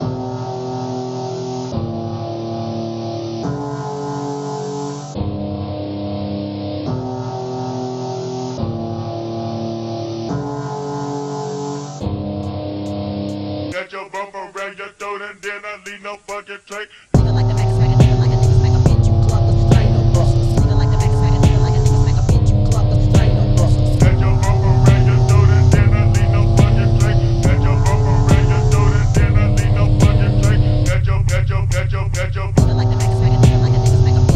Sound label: DRIFT PHONK